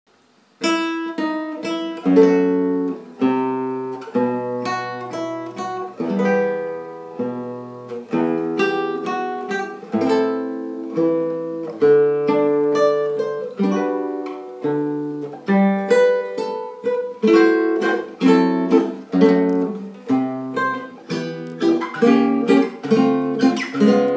Guitar solo

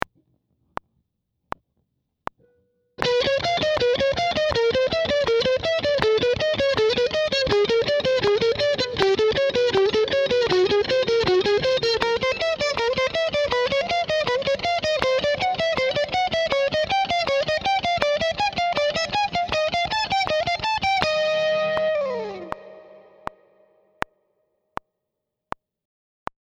slow 80bpm

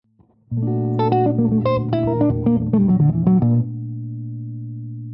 Lydian Jazz Lines

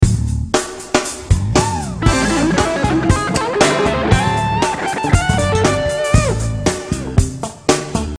Lick

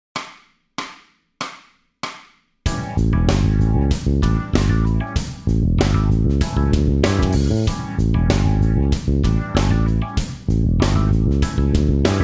Pentatonic Evo 7 base

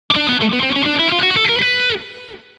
Lick

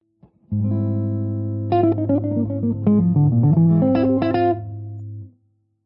Lydian Jazz Lines

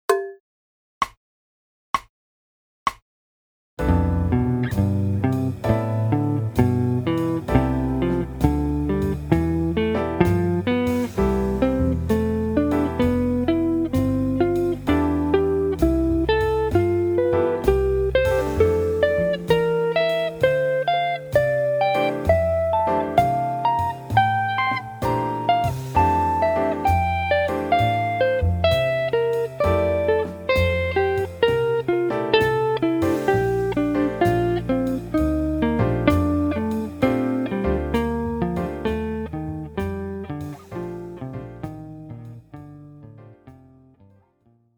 major 7 exercise quarte consecutivamente, guitar

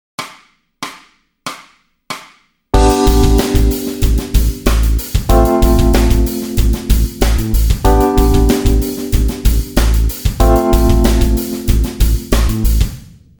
Backing Track

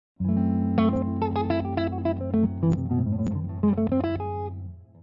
Locrian nat. 2 Jazz Lines, guitar

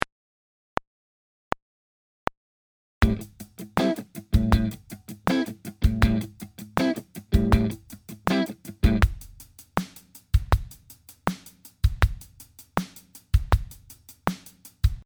groove

